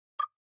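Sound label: Flash Beep Tap Shimmer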